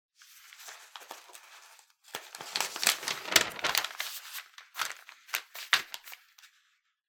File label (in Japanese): 新聞紙めくる音